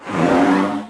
Elephant Blow; Civ4 warlords; Resouces and Improvements

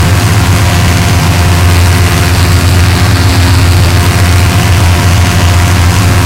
an2 side, engines; War Thunder

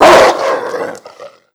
dog bark; Call of Duty Modern Warfare

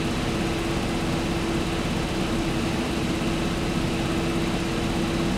maus engine rpm; War Thunder

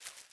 ui pickup seeds; Rust

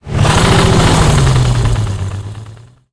panzer3 engine load, tanks; engines; War Thunder

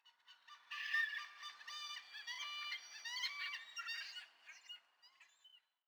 sting gulls; Rust

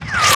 combat atmosphere; World of Tanks

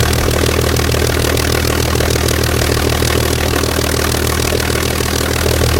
griffon side, engines; War Thunder

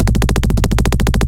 loop shilka bass; guns; tanks; War Thunder

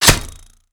knife flesh; Call of Duty Modern Warfare; physics

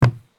sprint wood; Escape from Tarkov

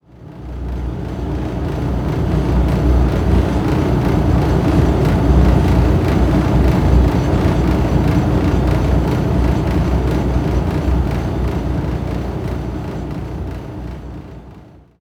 ambient battle; World Of Warships

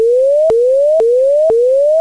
Cockpits RWR Launch Warning; DCS

